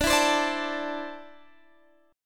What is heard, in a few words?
D 7th Suspended 2nd down Guitar Standard; D7sus2 strummed; capo=3